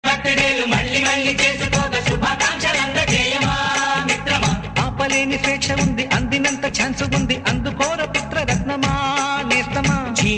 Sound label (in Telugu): song, telugu; suswagatam